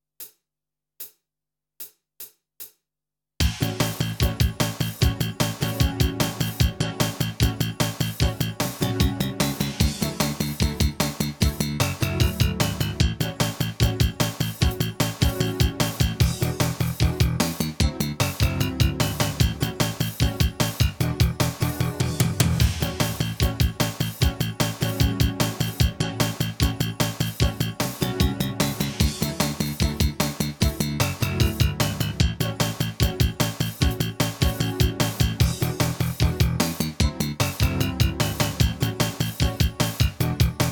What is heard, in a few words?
blues 8 beat short, guitar